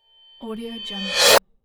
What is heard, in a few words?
reverse cymbal short